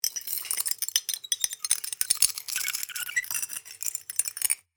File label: Glass friction movement